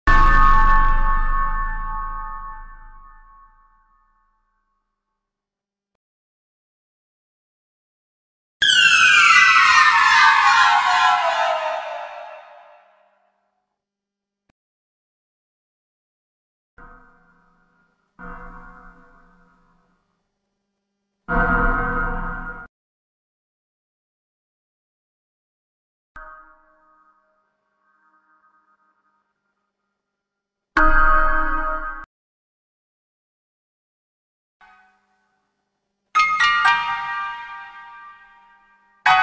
suoni horror horror sound creepy sound scary sounds

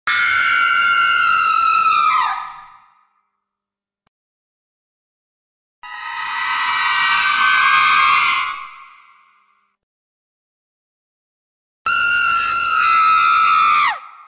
suoni horror suspense e urla scary sounds creepy sounds halloween sounds

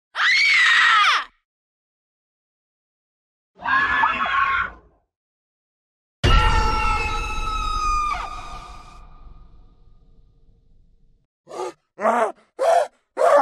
scary sound suoni di urla terrificanti suoni horror

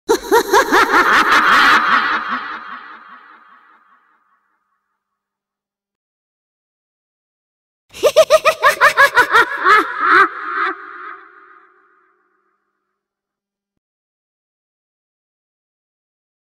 risata inquietante horror sound creepy sound

